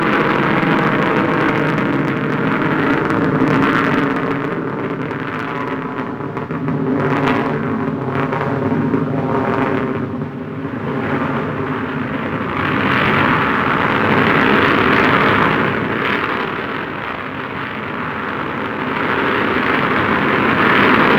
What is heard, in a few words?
Pursuit Space Ship Loop; Warframe; Grineer; Vehicles